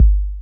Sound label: K 808, KICKS TR 9+8